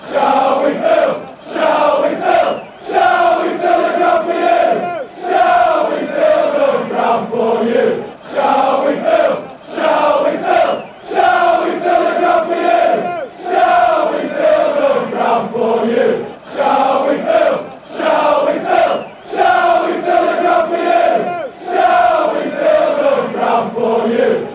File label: shall we fill ground you mufc fanchants